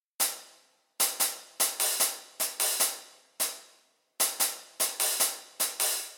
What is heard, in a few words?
drums